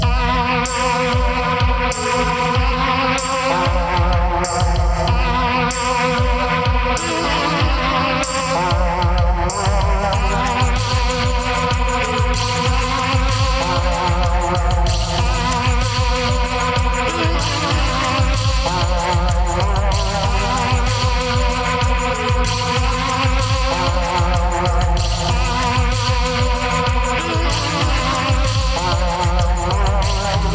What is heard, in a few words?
Suspense Climax